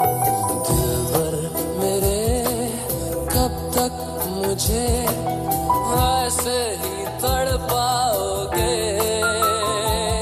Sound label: Sad Song Ringtone